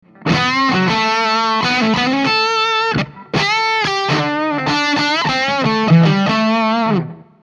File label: red lick; Rambler 25W; in an casual manner with a Shure Unidyne 545 microphone; The sound clips are recorded by Lehnert